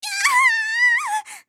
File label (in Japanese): きゃああああ！, 小悪魔; ハイグレボイス集